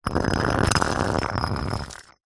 brick scrape on pebble concrete; foley

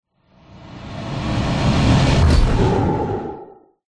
sound design whoosh heavy intense loud impact; zapsplat

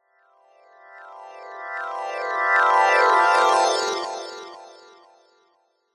fantasy magic glissando reversed bright twinkling

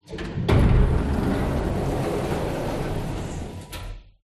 Elevator Door Open

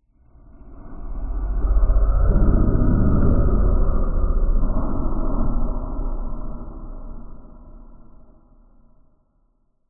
horror ghostly pass

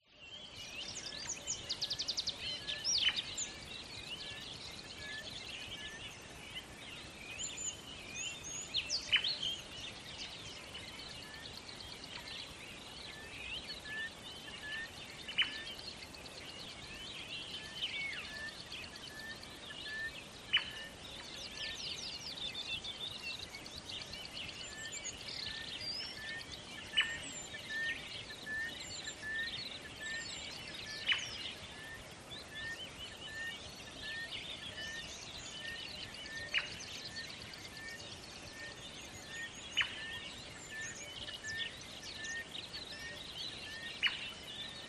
ATMO BUSH Water Hole Early Morning; smartsound

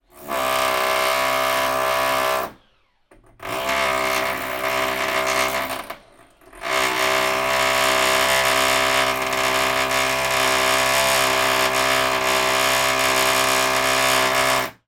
industrial drilling wall floor below

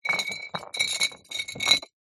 foley glass bottle roll on concrete

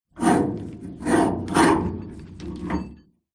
industrial chain drag scrape across hollow metal

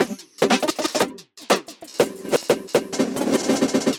Filthy Percussion Loop; soundbits

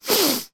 human man sniff

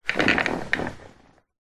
office chair wheels roll carpet short

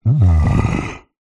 horror yeti monster growl grunt inquisitive; zapsplat

